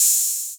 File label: gunk, Cymbals Electro